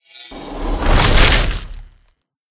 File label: Frozen bite